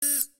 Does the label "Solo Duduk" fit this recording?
No